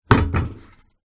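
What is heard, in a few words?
Metal Bang Wood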